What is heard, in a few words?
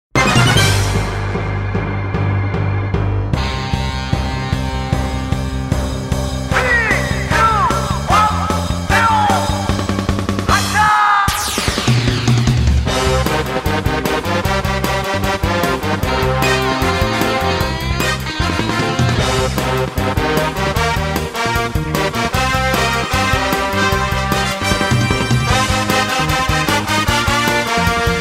theme instrumental; Mazinger Z